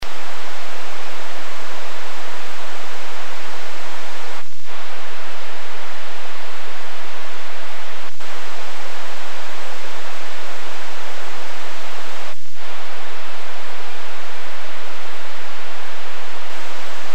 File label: fsk, amsat